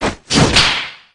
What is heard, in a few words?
cap toss; mamemomi